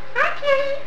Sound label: hi cutie, parrot